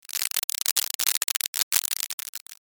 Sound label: applause